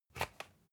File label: usb port metallic out soft